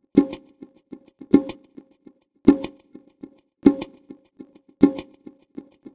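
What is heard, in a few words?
drum, separated component 1; drum pattern 2; Separation of Sound Sources by Convolutive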